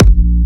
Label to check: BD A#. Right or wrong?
Right